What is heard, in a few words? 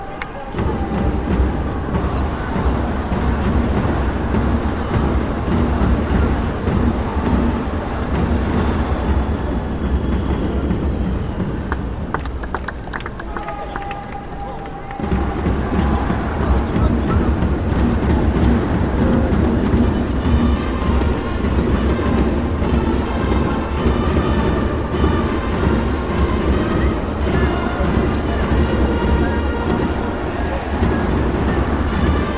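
Japanese baseball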